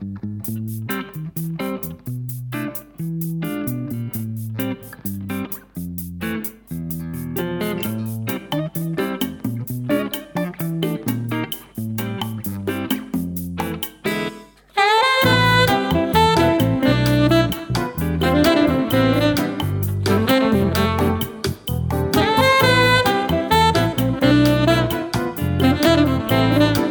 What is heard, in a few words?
Big City Bossa